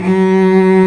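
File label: strings solo, cello orchestra; Roland - String Master Series; Combos 1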